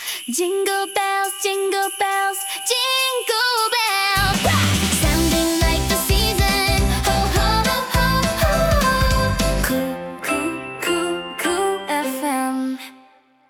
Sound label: Jingle bells… Jingle bells.... Jingle be